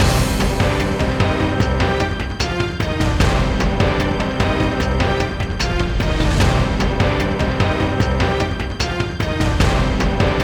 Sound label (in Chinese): 年会晚会; 年会背景音乐